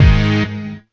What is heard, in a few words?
stab